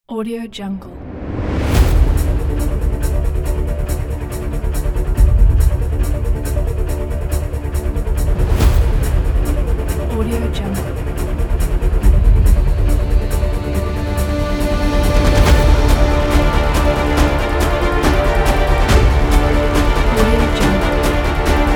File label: short cinematic teaser